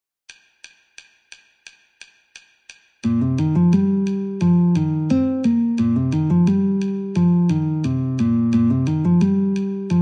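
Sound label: E Flat Guitar metronome 88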